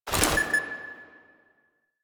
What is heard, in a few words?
Resource Pickup NPC Item; Warframe; Abyss Of Dagath